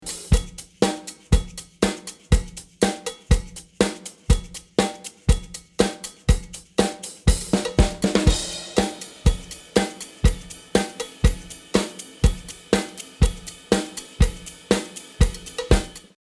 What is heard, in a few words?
drum recording, funk drums; hamburg; schlagzeuger musiker